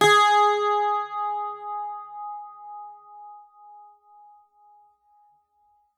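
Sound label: bouzouki G#4; SoundBytes; Early Patches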